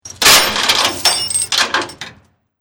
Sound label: Chain Metal Clanging; Metal Sound Effects; LYN103 package of Chain Sounds; Lynne Music